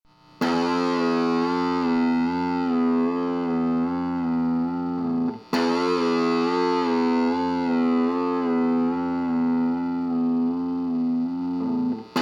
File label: string bend